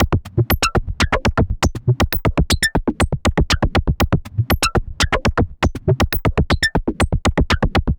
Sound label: Anabeat Mix, Beats